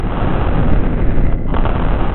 Smash Loop; incredible hulk chitauri takedown

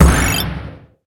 crash; crossthatroad

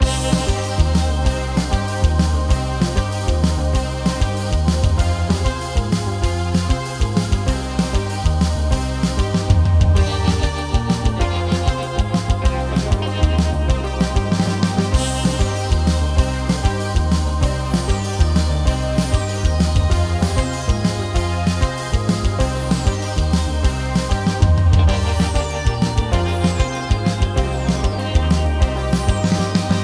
music main menu; spider-man-mysterio-rush-game

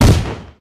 enemies normal die; spider-man-mysterio-rush-game

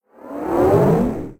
boss rocket load; spider-man-mysterio-rush-game

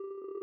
numbers Increase; Domino

